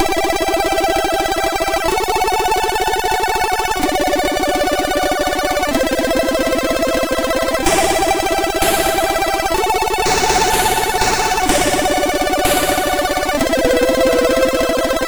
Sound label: Techno sid MOS6581R4